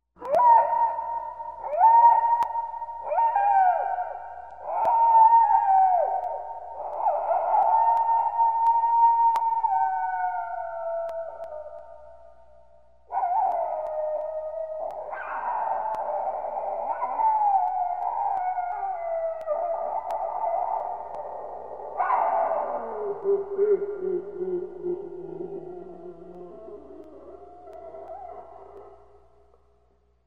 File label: Wolf Howl with echo effect